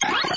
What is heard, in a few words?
button; Junkbot